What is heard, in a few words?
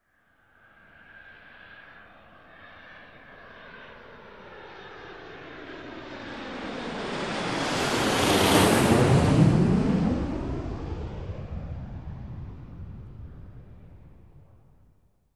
Jet aircraft flying over